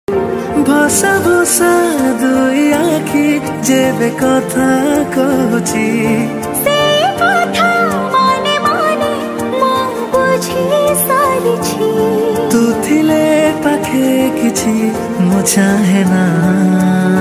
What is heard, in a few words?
New Odia Romantic